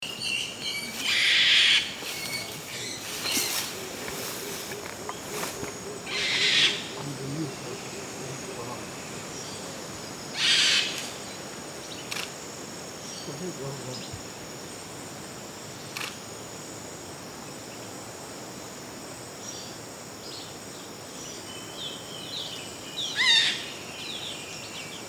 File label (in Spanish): Cacatua de las Tanimbar